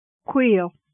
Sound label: quail